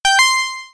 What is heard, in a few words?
surprise